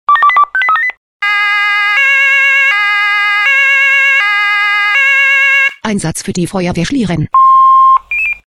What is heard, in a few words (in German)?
SMS Alarmierungstone; FW Schlieren